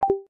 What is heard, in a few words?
button; dragon jump